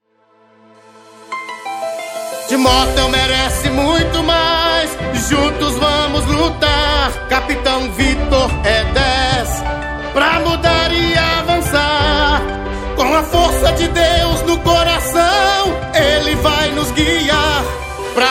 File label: JINGLE; Capitao Vitor